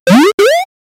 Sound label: crunching sound satisfyin; electronics